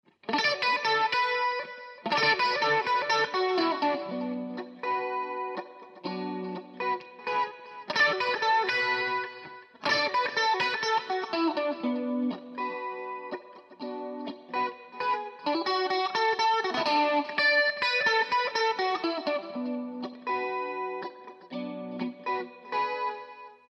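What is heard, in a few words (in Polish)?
git. elektr., electric guitar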